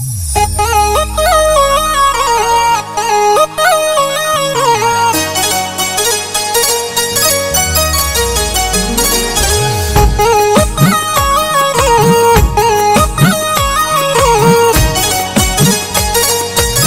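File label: Gujarati ringtone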